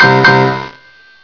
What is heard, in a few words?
synthe; cstrike; de rats theatre